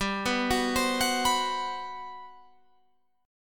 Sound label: G Major 11th, Guitar Open F; arpeggiated; JGuitar